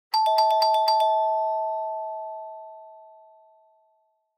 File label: Doorbell Fake